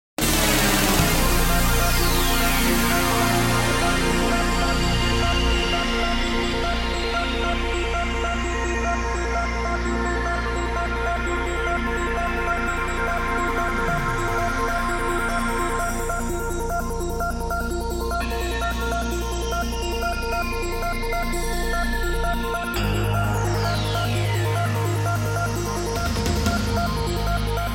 宣传片背景音乐

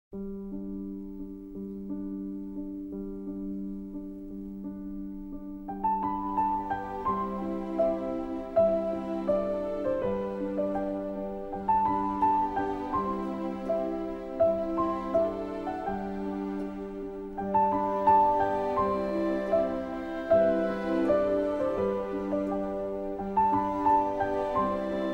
抒情的背景音乐

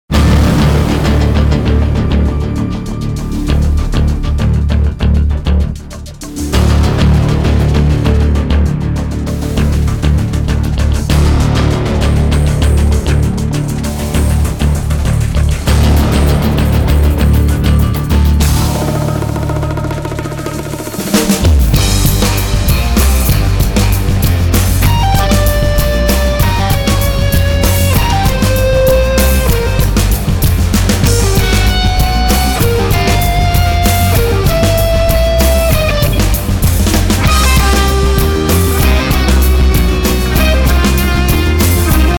电影音乐; 奥斯卡电影音乐

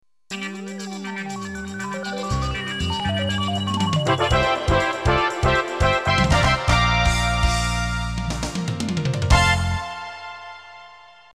电视包装音乐

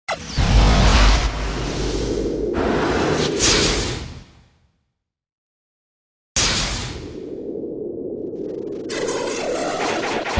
电视包装音乐

